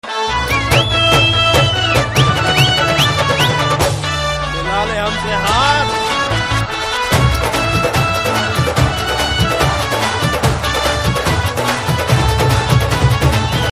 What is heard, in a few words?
drum beats ringtone; Zero Teaser